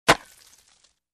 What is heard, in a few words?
Smashing rocks together